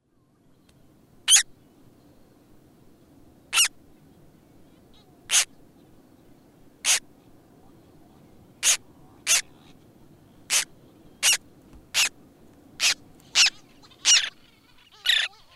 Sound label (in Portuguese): Cantos Crias Garajau Rosado; aves marinhas; garajaus